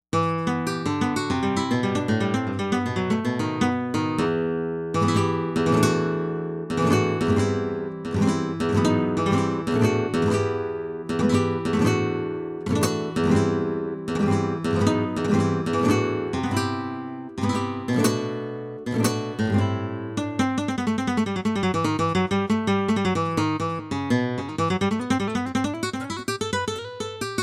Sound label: flamenco guitar; jupiter-80